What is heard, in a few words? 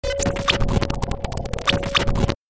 Static